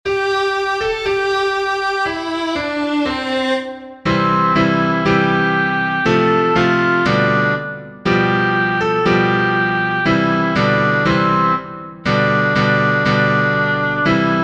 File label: Backing Track